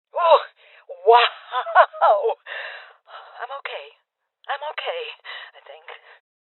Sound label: Voices Armor Shot Female Old